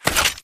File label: ammobox ammorefill; coop; Titanfall 2